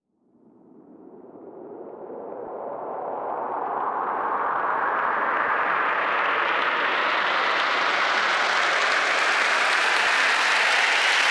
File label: FX Uplifter